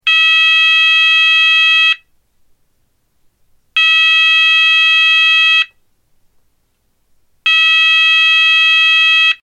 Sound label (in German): Ton